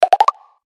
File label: popup, alcatel; Рингтоны, звуки будильника, уведомлений и системные сигналы смартфона Alcatel